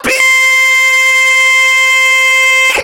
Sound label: bing; 电棍